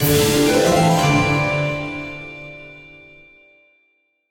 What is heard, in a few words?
medium Win; netent; secretofthestones